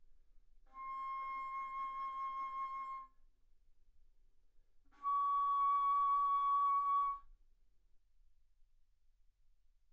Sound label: Bass Flute C6 Db6; ff